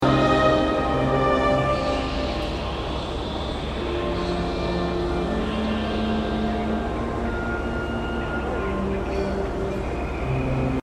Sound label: brass band at paddington station london